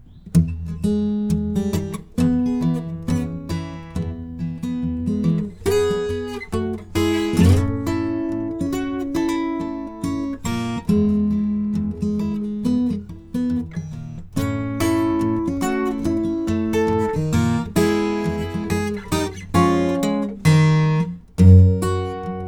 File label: Raw, guitars